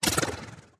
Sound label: teratoma bounce large